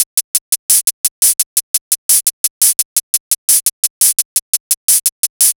Perc Halftime, 172

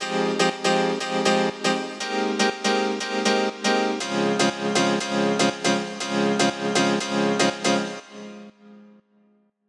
Piano 120 F Tail

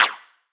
Snare Shot

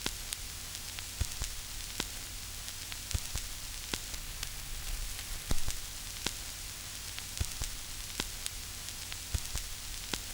fx vinyl noise

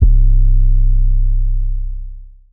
808 C

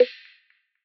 Snare

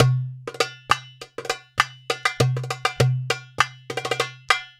Tabla 100

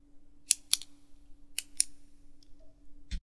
Pen

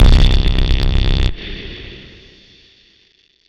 Blown Stab C

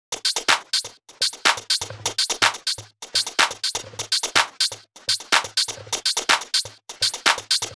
TOP LOOP, 124

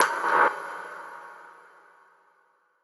snare rim; shamverb

